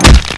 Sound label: big hit body, weapons, Pipe Wrench